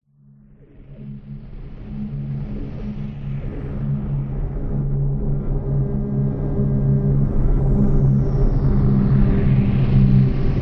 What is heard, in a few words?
science fiction spacecraft slow fly by; Audio Mangler